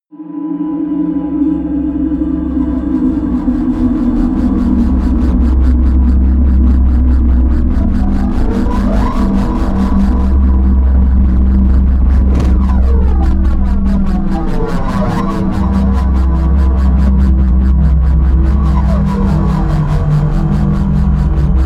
drone distorted wobble

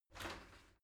shower slide door slide short open

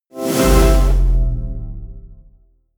promo pad; whooshes-rises

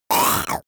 monster choking zombie short; comedy-cartoon; soundpool

